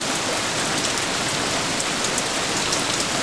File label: rain ground; Quantum Redshift